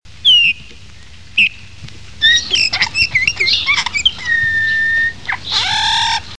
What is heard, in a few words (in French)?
bouvreuil